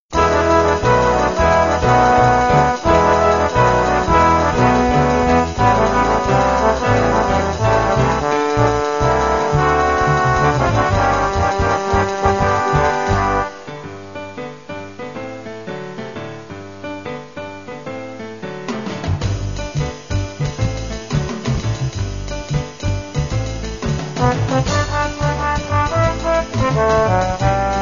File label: calypso, trombones